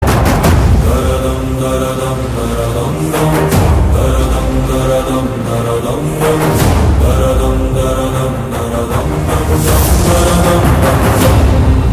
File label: Tara Dum Tara Dum; RRR; Tamil; Motion Poster